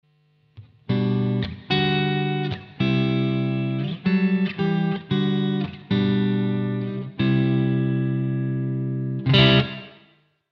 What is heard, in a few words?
Amp A Reverb Room; Simlpifier